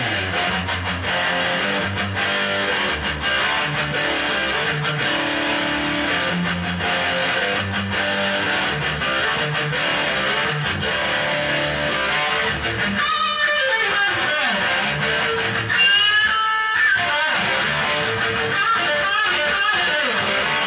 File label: My Crunch Riff